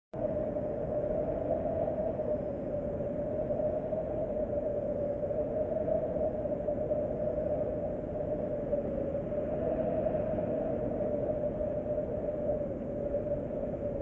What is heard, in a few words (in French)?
ambiance